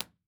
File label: IR Bin Front Right, Listening Room; IR Binaural 16bits